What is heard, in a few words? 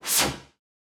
High Fast Swish; Whooshes